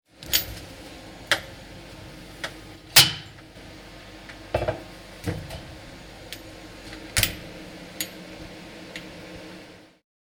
Jääkaappi

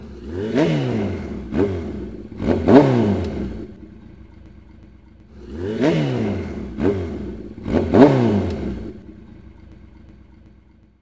moottoripyora

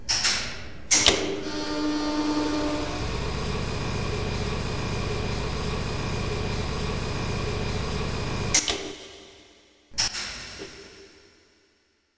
Hissi

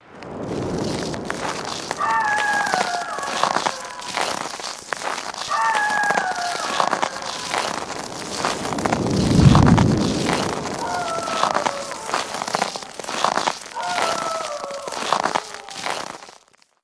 talvi

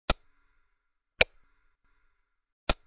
valokatkaisija

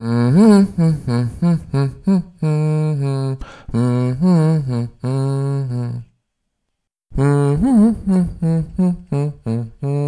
hyraily

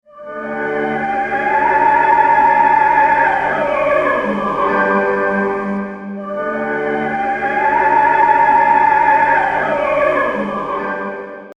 ooppera

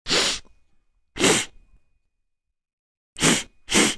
niiskuttaminen

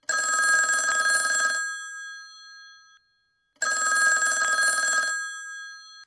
puhelin

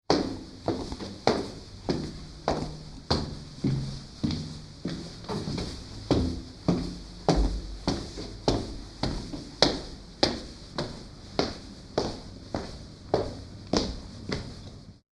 portaat

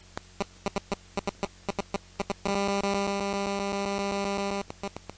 hairioaani

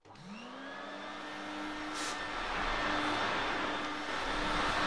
imurointi

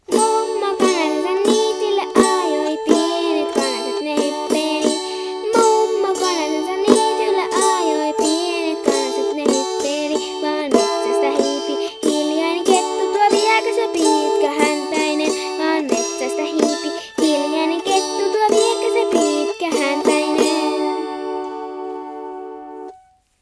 kantele laulu

